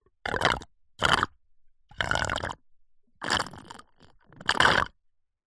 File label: human drink slurp cola through straw; zapsplat